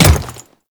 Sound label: Default Step, Footsteps; Royal Guard